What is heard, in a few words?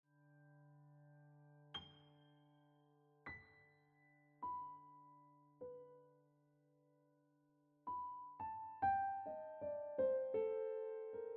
Cool Breeze Ringtone Piano Music